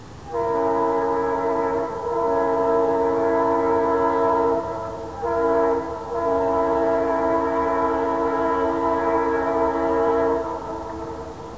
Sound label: Diesel